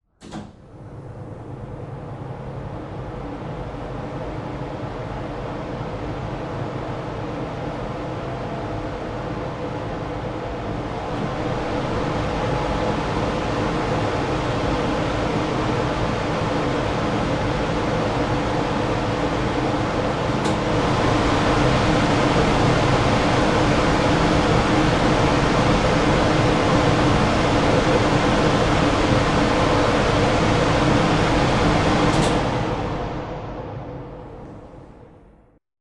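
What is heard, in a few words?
Kitchen hood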